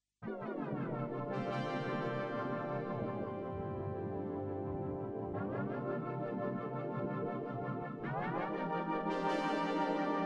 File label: jungle bass